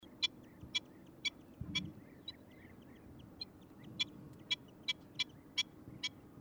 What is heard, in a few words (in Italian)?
Cavaliere d'Italia